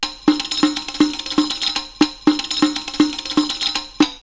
Agadir Acid 120, drumloops World Ethnic; X-Static Goldmine

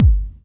KIT, Dance Mega Drums; Best Service

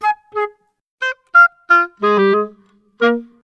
90 c min woodwinds; PowerFX - Downtown Orchestra; Orchestral arrangements

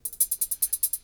HIHAT WORK C, DRUM FILL; Crypton - DrumBase5000

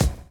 DRUM CHOP; AMG Gota Yashiki Groove Activator

